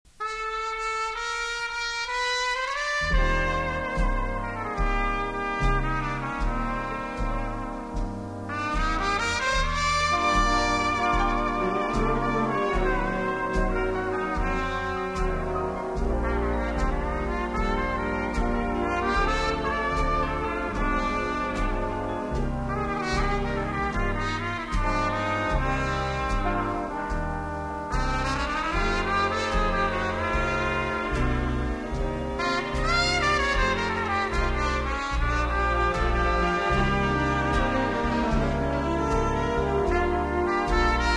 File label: big band